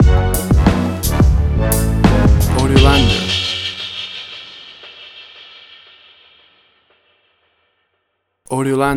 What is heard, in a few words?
Turbulent Orchestral Hip Hop Stinger